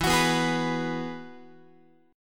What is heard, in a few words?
E Major Add 9th E, Guitar; strummed; 4A,4E,5A,5D,5G#,6C#